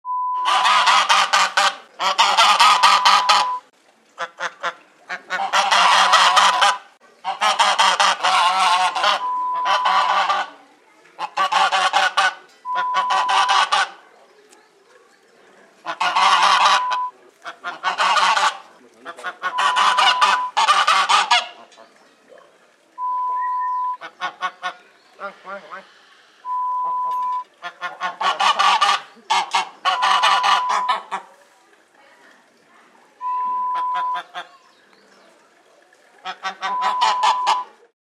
Geese sound

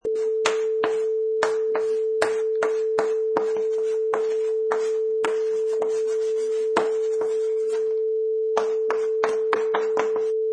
Writing with Chalk on Blackboard

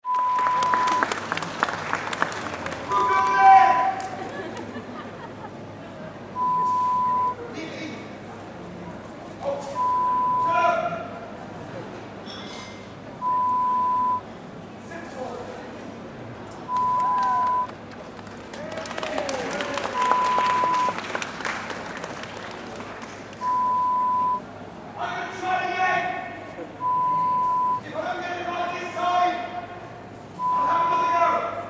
Street Performer sound

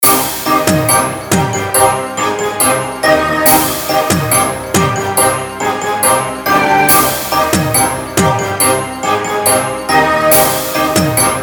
beat

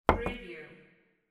plate set down

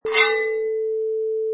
Swords Sing

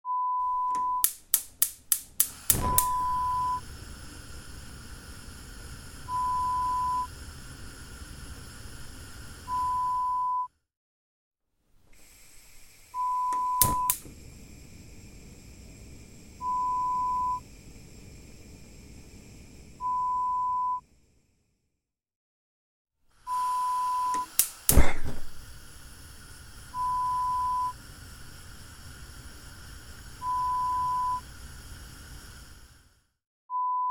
Gas Stove sound

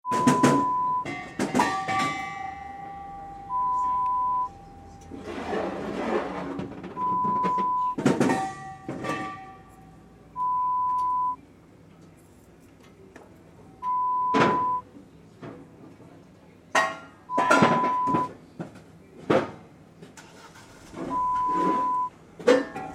Bottle Truck sound

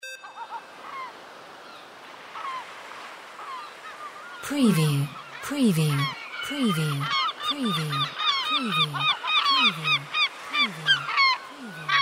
SEAGUL MULTIPLE

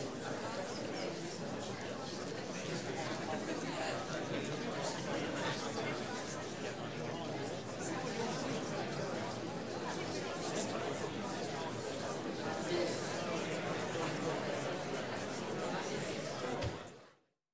Theatre Audience Mixed Animated

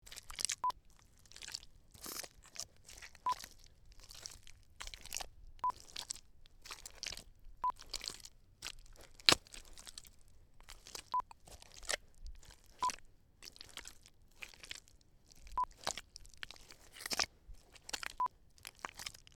Walking In Mud Exterior

